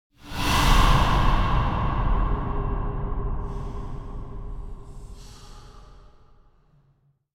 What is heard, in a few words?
Breath Demon - Startling Distant Growl; Hollywood Edge; sound designer's toolkit v2